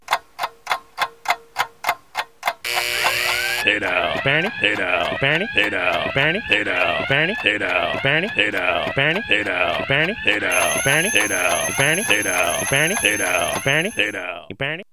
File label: bad alarm